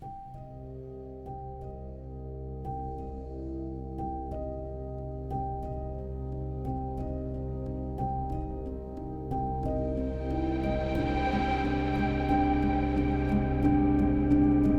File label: piano; ozark